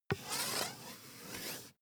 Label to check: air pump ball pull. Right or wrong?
Right